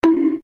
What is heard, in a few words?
bonk; minecraft